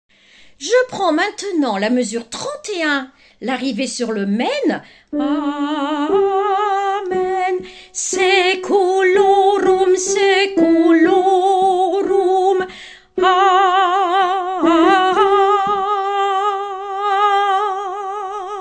Allegro Alto

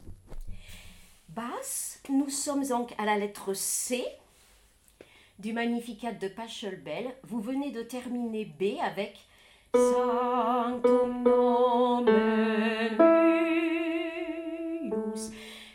Magnificat Basse; Pachelbel

